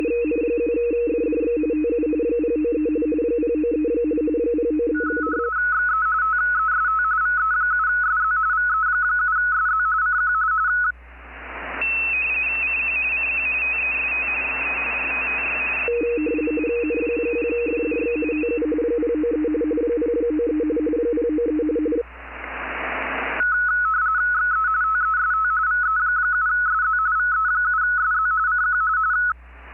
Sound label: 20m rtty